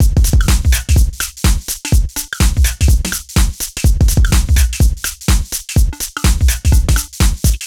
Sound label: Full Loop 125, Loungin House; Equipped Music